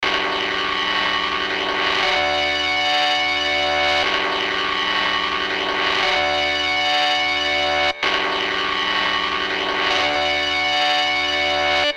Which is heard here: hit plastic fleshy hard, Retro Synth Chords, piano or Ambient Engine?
Retro Synth Chords